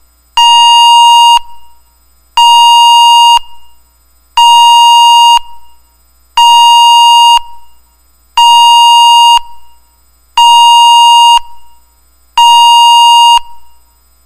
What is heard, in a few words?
special warning